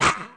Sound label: attack; Fly For Insanity